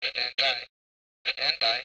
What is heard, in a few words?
walkie-talkie transmition; devices accessories utilities; NS Studios - ultra sound collection